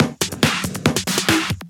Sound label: Loops Fills